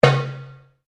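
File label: Drum Darabuka Slap forte, Egyptian Darabuka Drum; Commanly available Egyptian Darabuka Drum; Drums; Sound Effects:Musical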